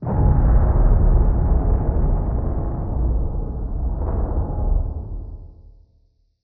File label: structural explosion distant